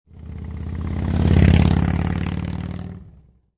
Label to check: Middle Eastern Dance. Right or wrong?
Wrong